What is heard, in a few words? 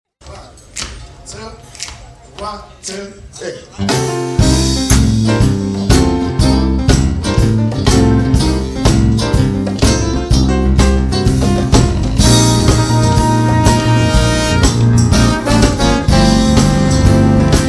pop